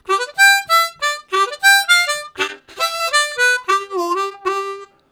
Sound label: Juke jump, Harmonica; Zero G